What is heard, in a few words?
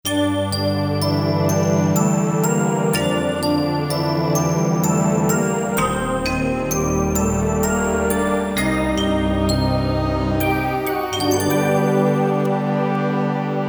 Borealis Pad